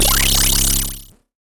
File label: Cwejman S1 Rumble